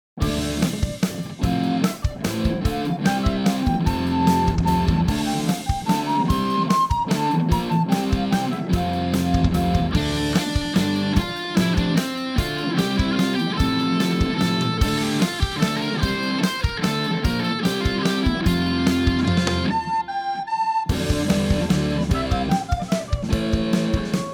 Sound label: Basse Danse; Capriol